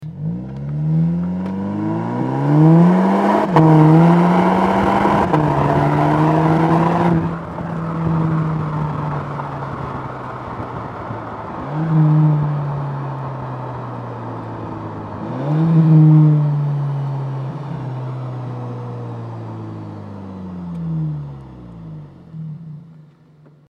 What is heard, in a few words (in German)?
Golf VII GTI OPF Kofferraum klein; catback-system; vor facelift; Fahrzeugheck; Abgasanlage